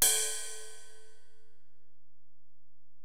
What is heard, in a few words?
Ride, Alesis DM-PRO